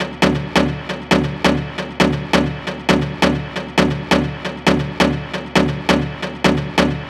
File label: Synth Loop D 135, Techno